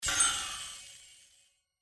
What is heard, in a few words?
anticipation end; Fondant Favourites StarTrail; Eyecon